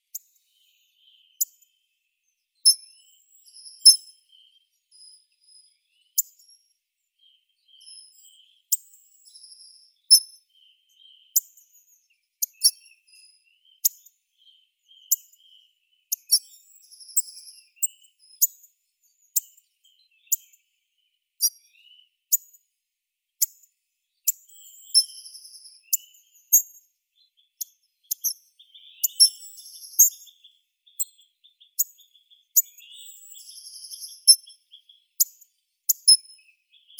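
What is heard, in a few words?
hawfinch, Coccothraustes coccothraustes; dubonos obiknovennii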